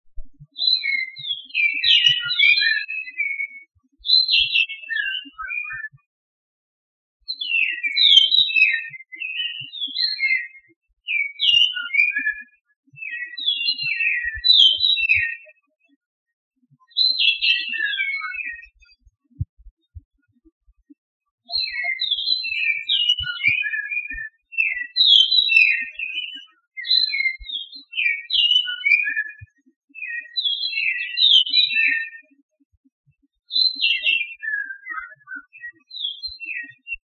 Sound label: redtailed palm thrush Kinshasa at night